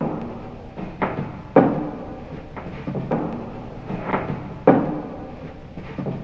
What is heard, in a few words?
rolling down machine, looping ambience